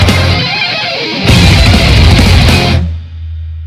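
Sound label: outro music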